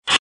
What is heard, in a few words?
card give; games; poker; American Poker The Legends II